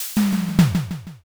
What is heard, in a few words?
fridge